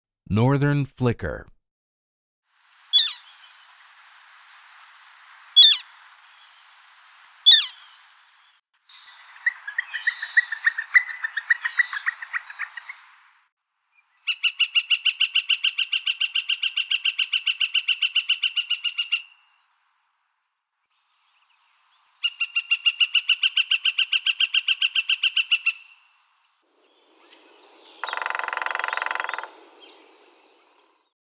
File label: Northern Flicker